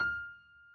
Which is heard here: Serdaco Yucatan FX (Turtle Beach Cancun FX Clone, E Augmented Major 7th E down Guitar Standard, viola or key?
key